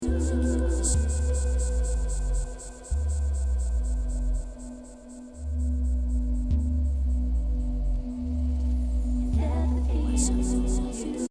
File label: live bass